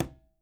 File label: Quinto, Percussion; Sampleheads; NYC Percussionworks